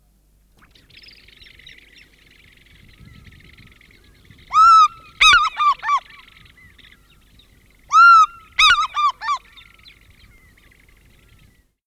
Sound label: Haliaeetus vocifer, African Fish-Eagl